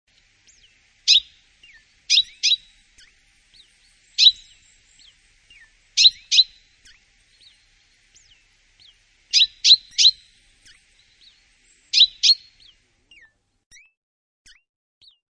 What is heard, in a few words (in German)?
Buchfink